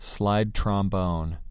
slide trombone

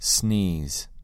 sneeze

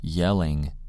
yelling

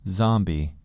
zombie

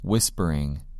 whispering

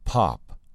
pop

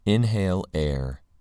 inhale air

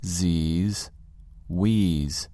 zs wheeze

